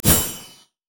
lux lightbinding; LoL

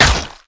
basicattack; LoL; twitch

